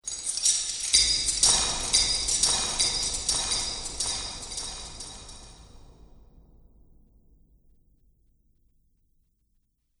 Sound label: Metal Chains Magic Ambience; Clink, Clatter, Clang, And Clink Are The Sounds That These Chains Make.; Andrew Fleming